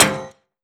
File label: frag physics bounce metal hard; gmod; viper